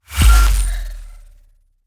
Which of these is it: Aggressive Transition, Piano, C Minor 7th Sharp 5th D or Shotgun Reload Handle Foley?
Aggressive Transition